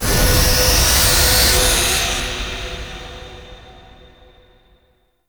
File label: Undead Birds; Warframe; Dante